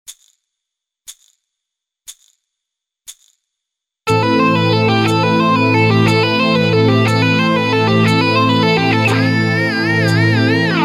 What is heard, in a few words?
Metal Legato